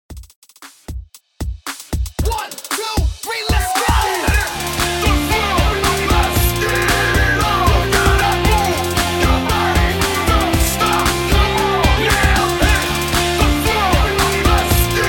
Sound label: HYPE REDRUM 115 BPM